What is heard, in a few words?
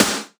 snare, Roland R-70